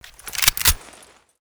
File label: hk416c draw; Escape from Tarkov